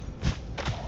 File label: jump; kena bridge of spirits